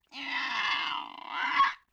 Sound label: LoFi Synthetic Bird Caw